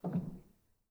rel, Grand; bitKlavier; Binaural

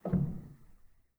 rel, Grand

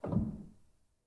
rel, Grand

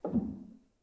rel, Grand; bitKlavier; Binaural

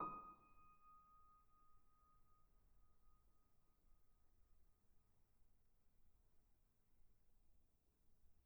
D#6, bitKlavierGrand Binaural

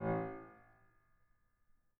harm A1, Grand; bitKlavier; Binaural

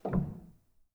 rel, Grand; bitKlavier; Binaural

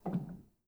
rel, Grand

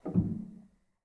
rel, Grand

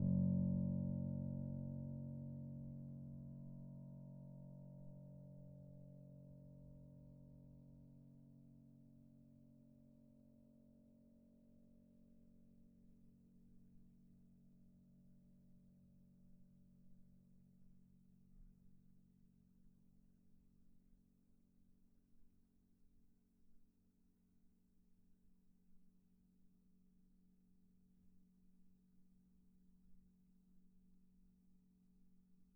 F#1, bitKlavier Grand Binaural

